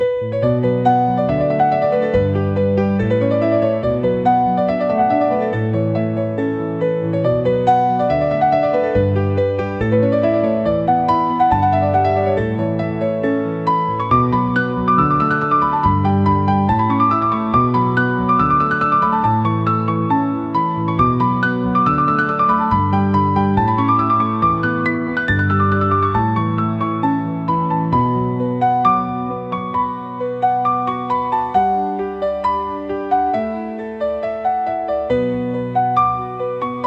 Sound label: piano; dies nox